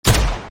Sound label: shot; ZombieBattle